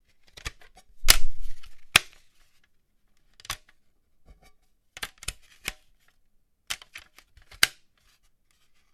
Plastic DVD case handling open and close

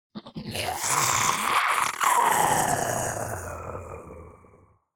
Demon beast imp monster groan death

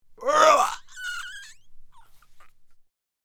Man choking

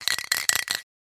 Spray paint can shake

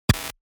Holographic communication interference

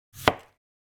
Wooden cutting board single chop

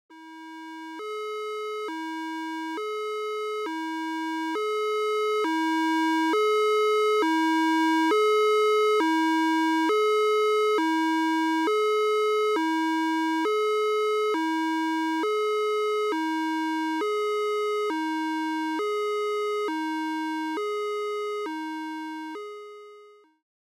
Ambulance siren emergency long burst

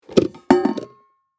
Pedal bin open lid; household

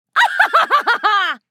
Crazy female evil laugh

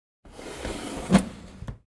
Wooden drawer closing

